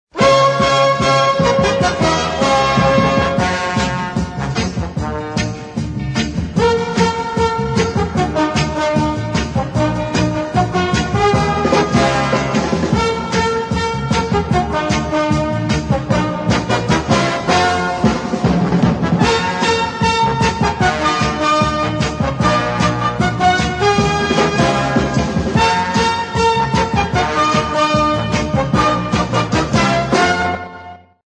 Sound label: goal version loud; ring tones; mobile phone